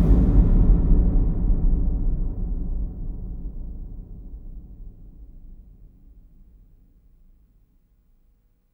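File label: DISTANT DRUM, Impacts, Hits & Booms; Distored Reality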